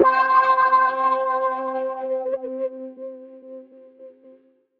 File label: LEAD (C), Pedal One Shots; Cover; Cymatics; ANALOG EVOLUTION Beta